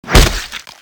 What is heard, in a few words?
skull axe hit, weapons; csco; csgo